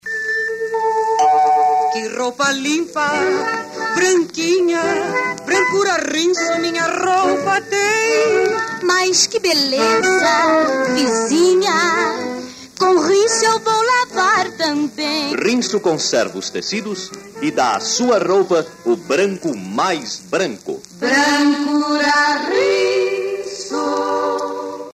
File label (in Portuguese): jingle sabao rinso; Educomunicação - Jingle Sabão Rinso